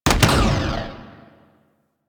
Spear Gun Shot; Warframe; Weapons; Corpus

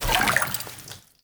Walk Water, Footsteps; Enemy; Lady Deora

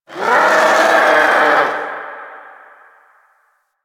Drifter Camp Vulture; Ambience; One Offs; Birds; Warframe; Quests; The New War

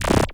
Corrupted Notes J; Warframe; Bard Quest; Bard Boss Fight

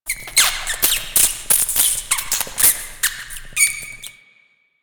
Amb Misc Subway Mice; Warframe; NineteenNinetyNine

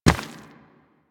Operator Run Rock, Footsteps; Warframe

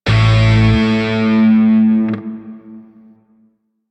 Shawzin Maj Chord L; Grineer; DE; Warframe

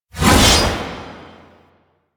Hammer Glass Whoosh B; Warframe; Weapons

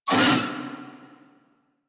Amored Vocal Pain; Warframe; Kubrow; Armored

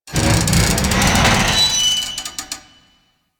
Asteroid Metal Scrapes And Hits; Ambience; Warframe; Grineer Asteroid

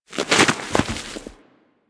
Armadillo Move Snow; Warframe; Hunting Critters; Foley

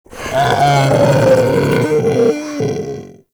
Infested Vocal Charge, Enemies; Warframe; Femur

